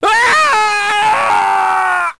scientist scream

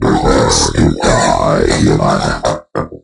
drone warn; dn3d r

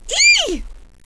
pain, female; kingpin; blunt

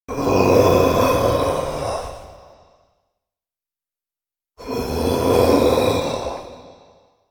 zombie idle

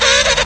penguin die

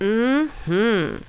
"mmhmm", female; kingpin; yolanda

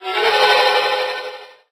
octabrain idle; dn3d r

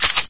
mp5k bolt slap

